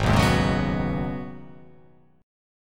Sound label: G sharp Minor 11th G sharp, chord